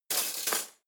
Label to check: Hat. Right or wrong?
Wrong